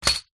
office padded envelope containing small plastic items drop wood; zapsplat